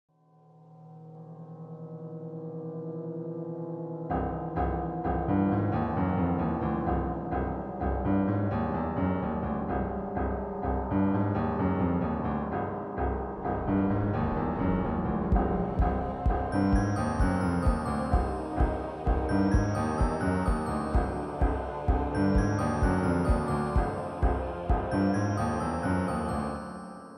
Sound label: thriller horror; Jamie Coleman, Film Composer